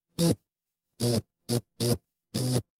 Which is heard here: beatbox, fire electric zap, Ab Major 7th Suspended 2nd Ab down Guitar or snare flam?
fire electric zap